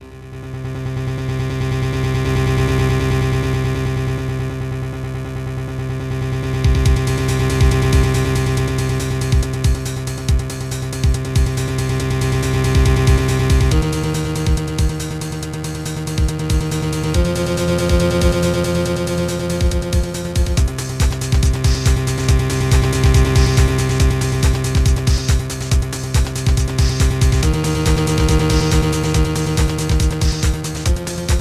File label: Suspense; Phoenix Wright Ace Attorney Trilogy; Nintendo 3DS